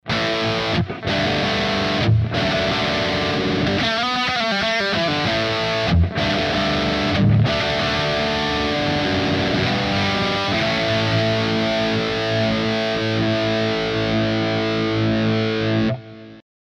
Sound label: Epiphone Modern Les Paul Bridge Highgain